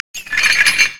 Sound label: brazier chains